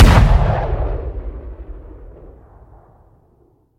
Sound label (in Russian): oglushitelnij moshnij vzriv